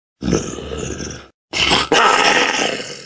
reptile roar; Mortal Kombat 9

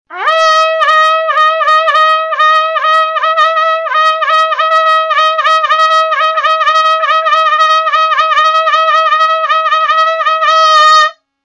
hunting horn

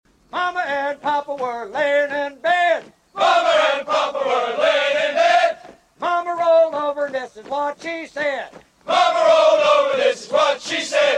marching song; Full Metal Jacket

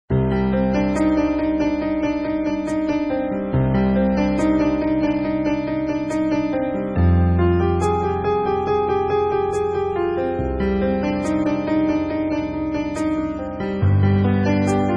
film piano; Indian

